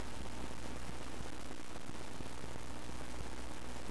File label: timer; tfc; weapon